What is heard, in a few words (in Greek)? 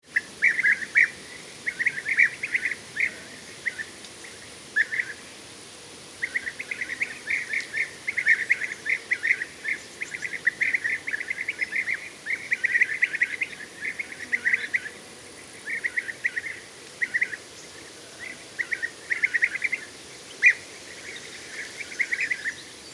Melissofagos